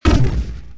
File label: gun Impact; merge-dragons-2